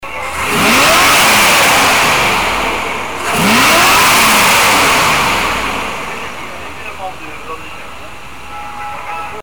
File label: chevrolet camaro 1967